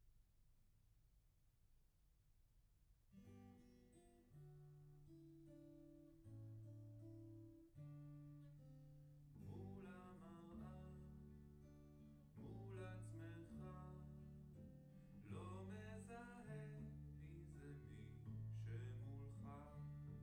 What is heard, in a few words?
Piano L